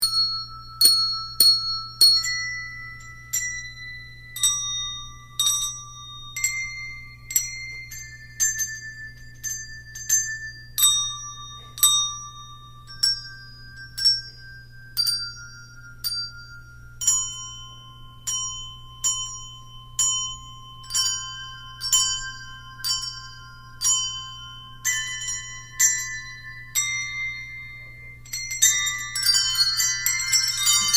bells

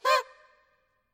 PARTY HORNS, BALLROOM

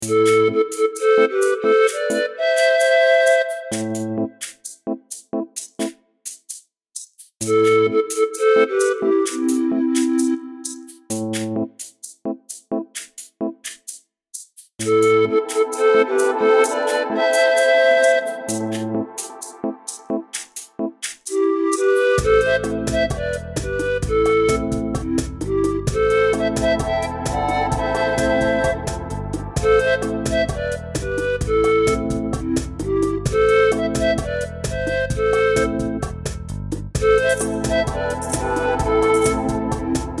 Cumbia Pan Pipes

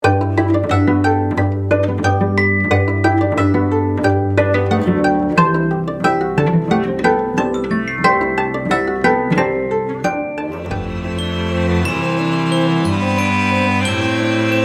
Quirky Tales

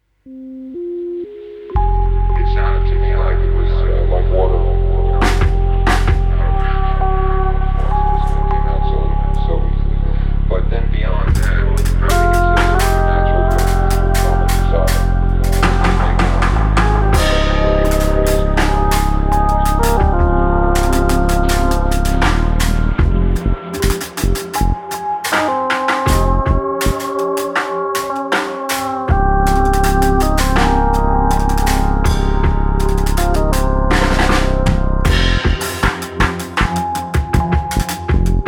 Drums; Cru Drums

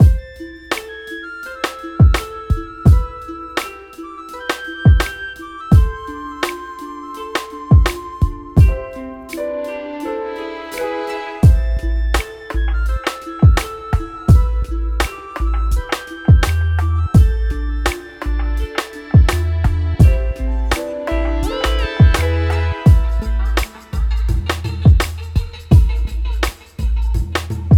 Afro beat

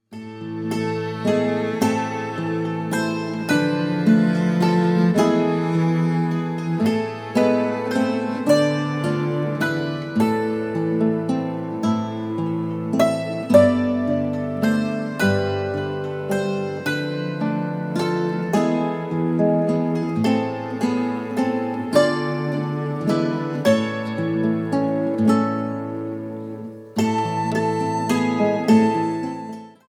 coventrycarol

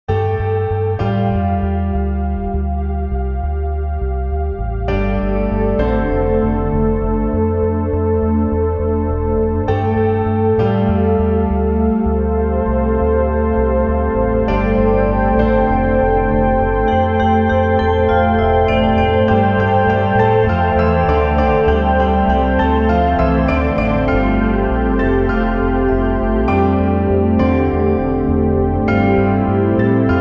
Low Drone

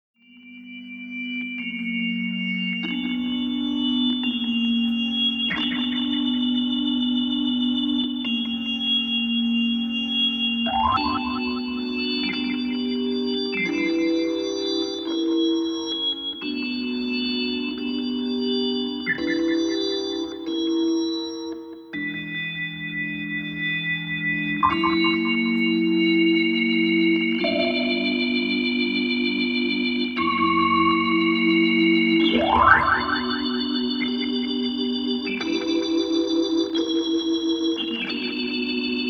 Blues (organ)

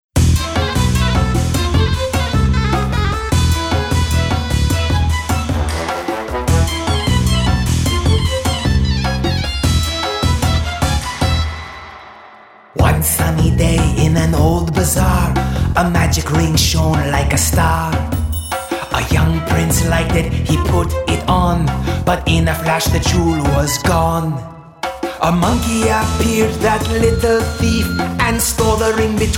Kids World Music

